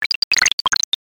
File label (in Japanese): gasagoso